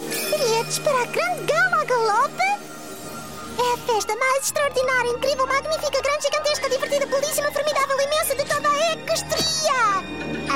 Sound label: European Portuguese; The Grand Galloping Gala; Season 1